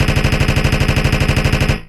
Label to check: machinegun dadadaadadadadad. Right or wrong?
Right